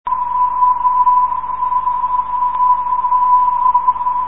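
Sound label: non-directional beacon; 371.5khz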